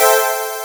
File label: HOUCEY CHORD